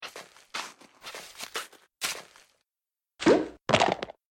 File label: teleport disappear; toontown just works